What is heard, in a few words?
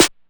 Q Snare, DIGITAL KIT